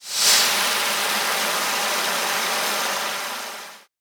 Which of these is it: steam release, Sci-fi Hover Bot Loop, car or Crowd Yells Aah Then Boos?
steam release